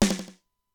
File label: Simmons SDS-9, Drum Machines